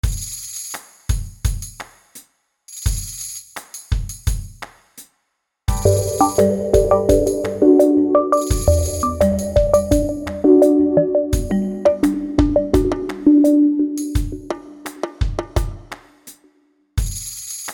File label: Beat Slow Reverb Mallet; Miscellaneous Loops